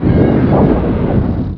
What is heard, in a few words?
attack muffled double, antlion; npc; Half Life 2; Episode 1